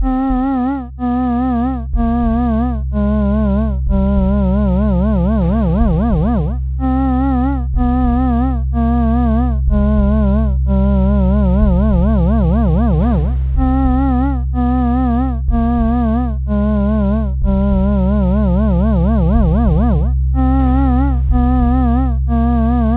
dark hromatika

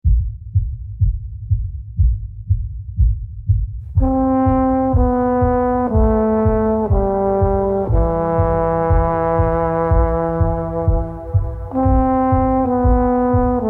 horror zurna minor; survival theme

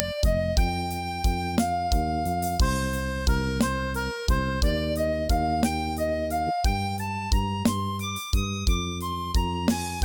cimbalom; lyria; survival theme

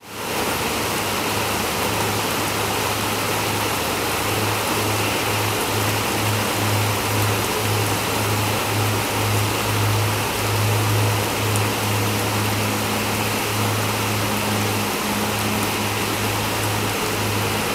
real rain

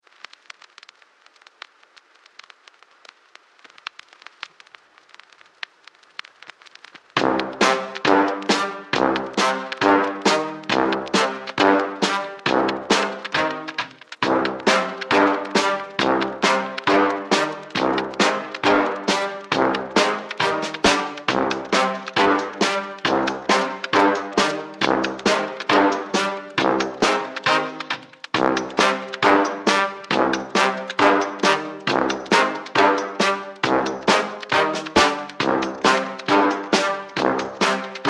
cimbalom accordion; survival theme

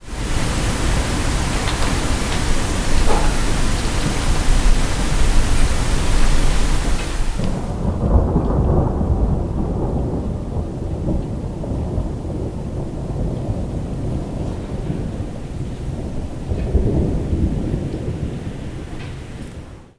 real thunder

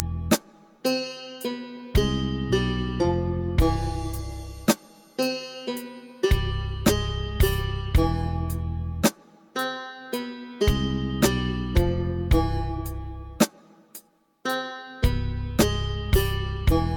alt zurna; lyria; survival sound

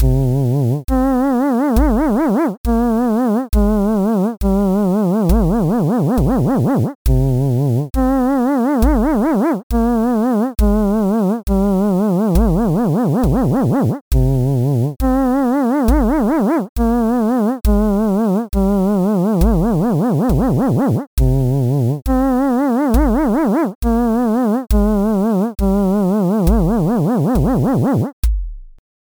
motif 5 oktava

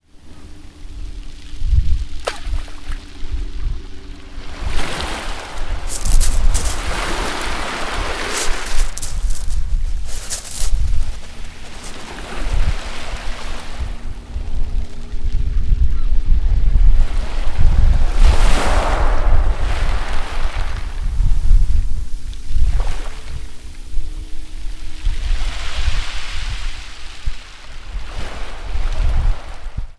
real sea shore

